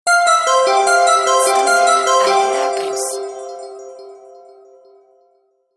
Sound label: bell